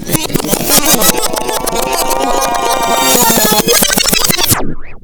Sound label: FX REVERSE L; Big Beat Samples & More; AKAI; OTHERFX KIT